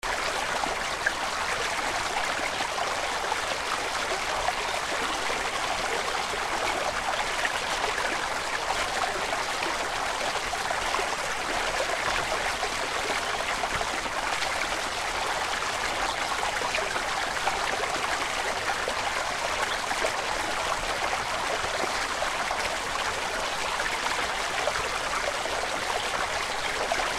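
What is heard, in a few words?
atmo arizona sedona canyon stream; call of the canyon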